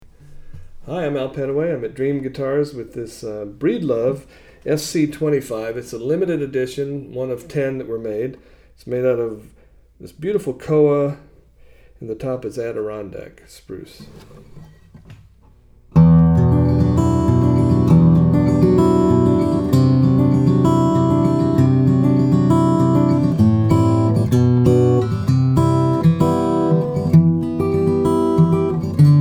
breedlove sc-25, steel string guitars flattop; grand concert

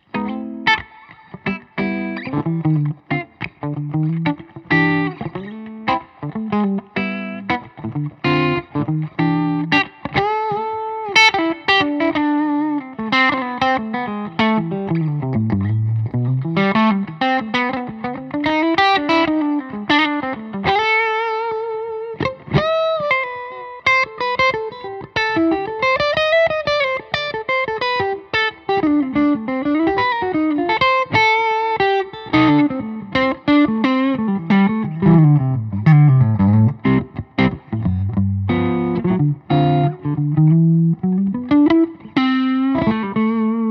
Semi Hollow, electric guitar; 2021; marchione; mahogany; maple

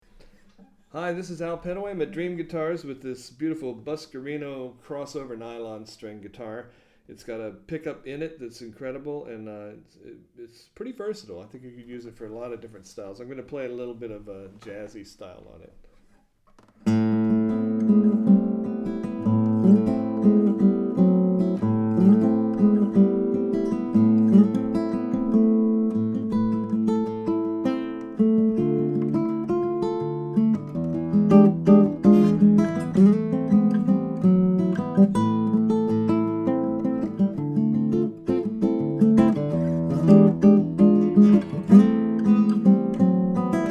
cabaret, nylon string guitar crossover guitar; buscarino

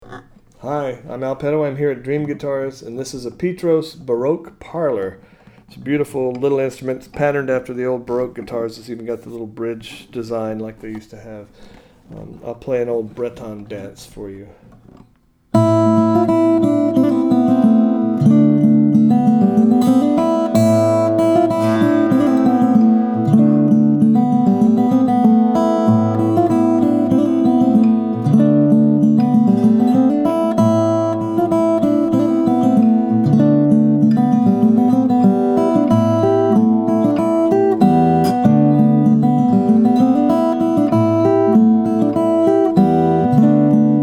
baroque parlor, steel string guitar flattop; petros

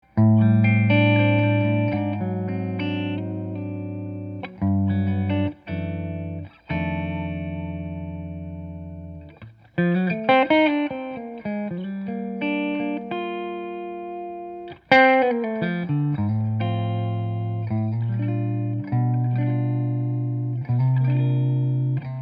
guitars; Collings I35 DLX; honduran mahogany; flamed maple

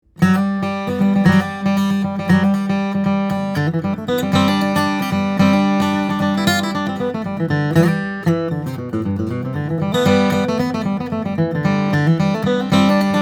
Henderson D 28, guitars steel string flattop; brazilian rosewood; adirondack spruce

